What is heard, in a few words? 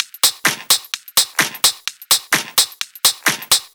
Loop, 128 BPM